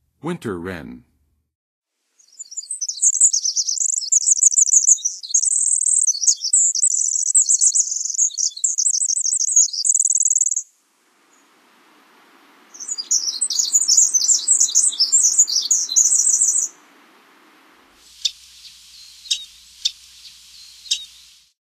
Winter Wren, Songs of Birds; North America; Western USA; Western USA Songbirds

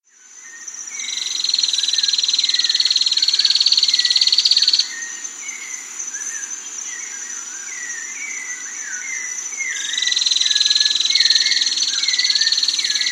Streak-Capped Antwren, S. America

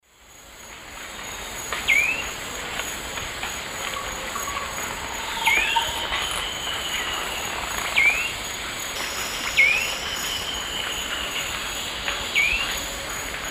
Pipile pipile, tew calls; S. America; Eastern Lowlands

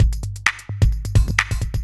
electro kit 130

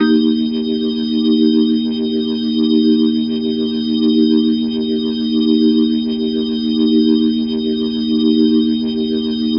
Glowing Organ D# Maj; Major; The Vitale Organ

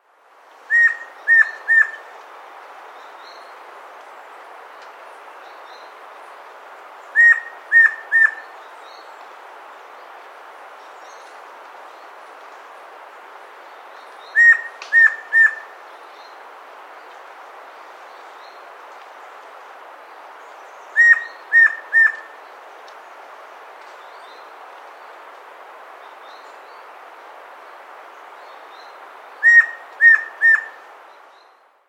Red-and-White Antpitta; S. America

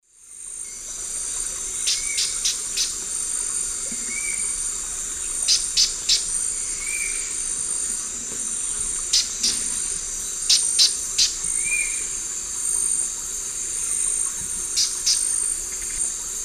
Glyphorynchus spirurus, call; S. America; Eastern Lowlands

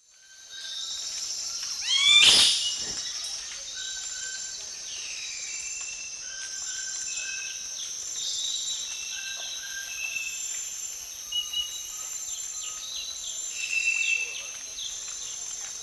Hylexetastes stresemanni, call and song; S. America; Eastern Lowlands

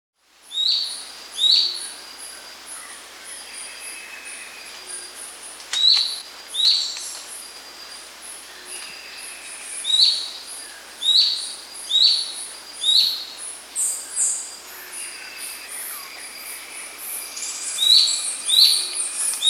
Slaty Antwren; Myrmotherula Schistic; S. America

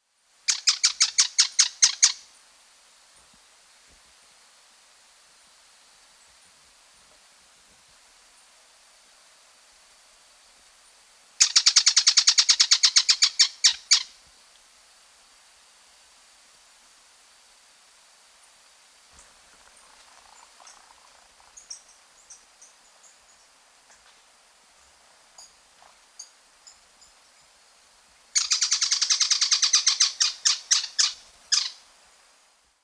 Slaty-Capped Flycatcher (Leptopogon, S. America

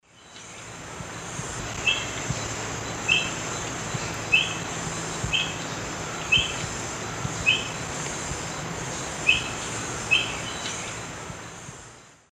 Mitu salvini, alarm calls; S. America; Eastern Lowlands

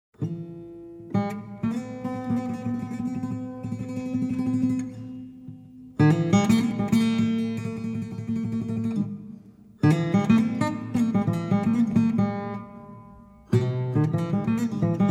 Desert Blues

